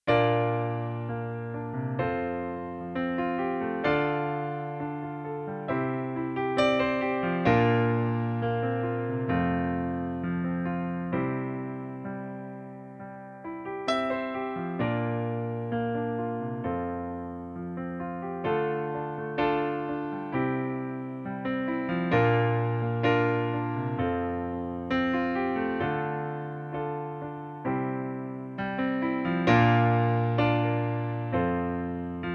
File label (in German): Pianoplayback F-Dur